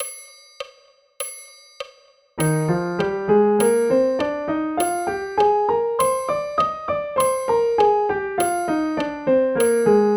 Eb Major Scale and arpeggio; bastien

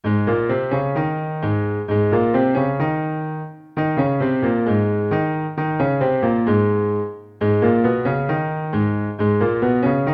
16th note in A flat and A flat m

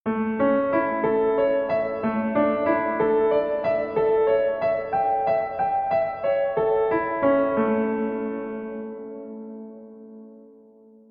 last a asus4 a7 a

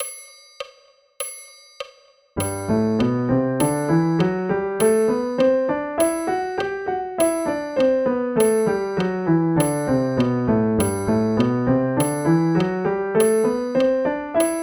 G Major Scale and arpeggio; bastien

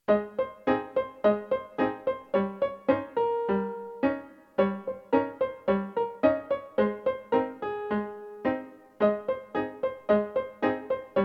practice jamaican jive in a flat

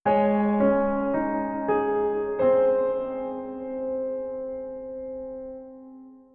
last a flat sus4 a flat; cc madoromi

